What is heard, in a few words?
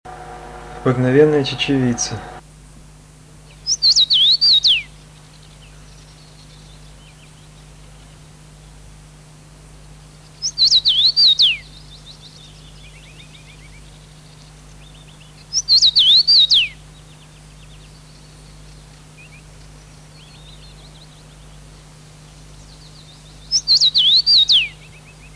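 obyknovennaya chechevica golos, common rosefinch voice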